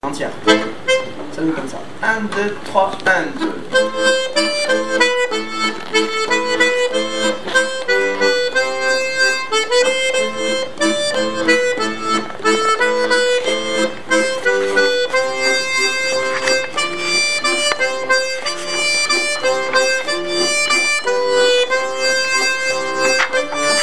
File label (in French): Voix 2 flute